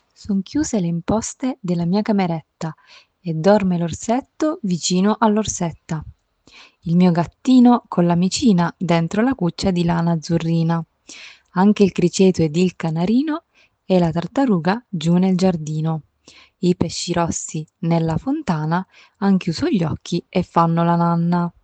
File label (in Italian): La filastrocca; Le ninna nanne della scuola delle donne